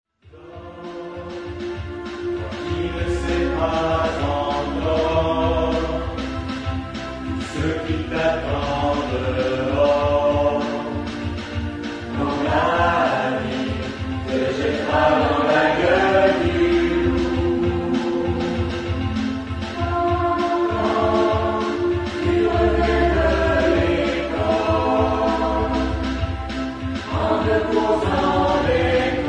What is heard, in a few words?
SATB